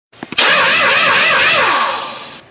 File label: impact